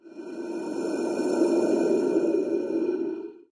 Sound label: air low; openspace3d; vrcells